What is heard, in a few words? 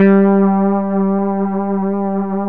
VIOGUITAR; E-MU Formula 4000 Series Vol. 2